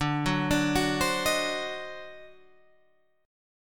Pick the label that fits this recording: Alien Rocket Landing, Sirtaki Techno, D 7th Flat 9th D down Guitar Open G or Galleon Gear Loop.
D 7th Flat 9th D down Guitar Open G